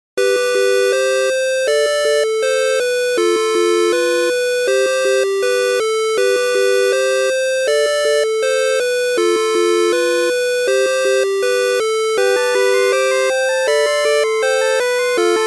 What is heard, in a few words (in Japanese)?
夢オルゴール; Project Yume 2kki; Yume Nikki